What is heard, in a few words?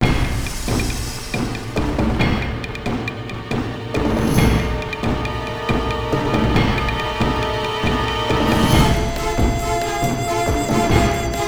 general intense; Xiaolin Showdown; XBOX